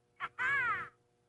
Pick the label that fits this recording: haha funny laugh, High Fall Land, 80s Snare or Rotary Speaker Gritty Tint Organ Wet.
haha funny laugh